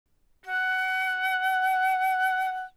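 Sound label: flute vibrato F#5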